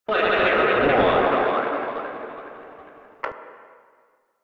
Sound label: Arcade Voices; Warframe; Ambience; Nineteen Ninety Nine